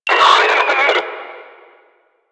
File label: Death; Warframe; Lasrian; Lasrian Enemy A